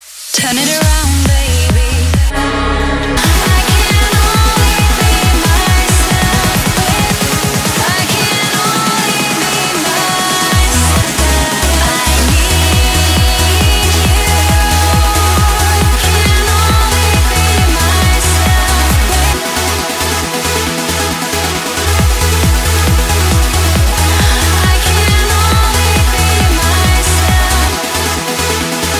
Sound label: Trance edm